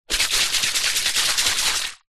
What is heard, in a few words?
household furniture polish can shake